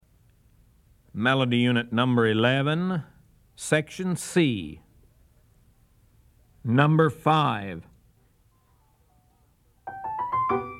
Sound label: melody, ear training recordings